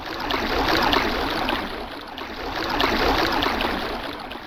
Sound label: water; sven; digilab